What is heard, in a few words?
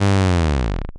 fail buzzer